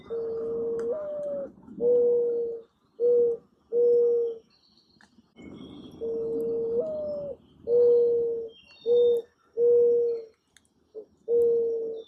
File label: Morning Dove Sound